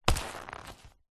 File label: leather handbag or pouch grab